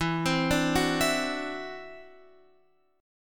E 7th Suspended 2nd Sharp 5th E down Guitar Open F; arpeggiated; chord; JGuitar